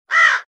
odinochnyj krik vorony

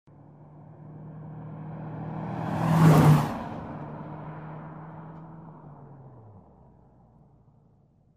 mimo

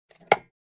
derzhatel deneg

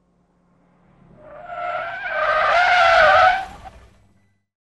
rezkoe tormozhenie

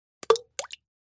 dvojnoe padenie melkogo predmeta

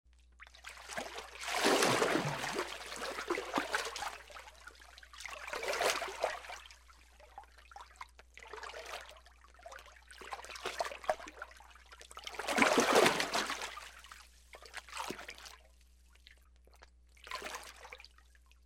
chelovek pleshhetsja v vode

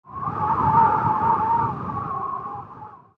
Zvuk poryva vetra vysokij

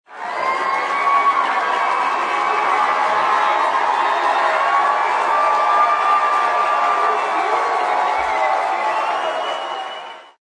Bolshaya tolpa kriki odobreniya

